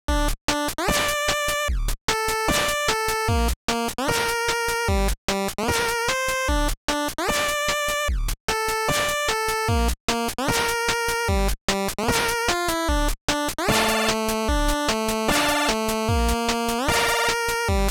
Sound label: chiptune